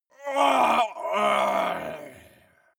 male groan painful double; menschen; schreie

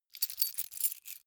key ring large rattle soft; objekte; schluessel

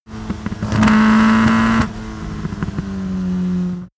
car opel kadett short acceleration deceleration

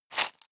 sundries shake hard; soundpool

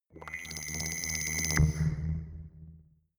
digital rattle swell short

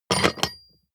metal roll click ring

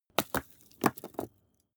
stone small fall; natur-tiere; steine

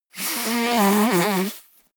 nose blow; comedy cartoon; koerpergeraeusche

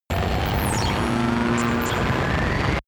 facility error, sciene-fiction

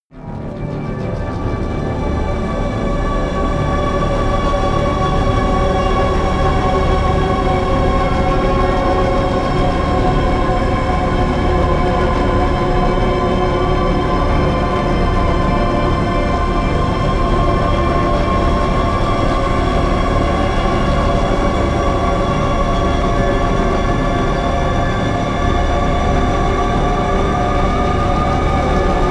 drone wavy pitch

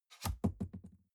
cardboard box open unfold bounce; objekte

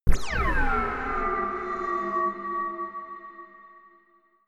ringing laser beam shot; soundpool

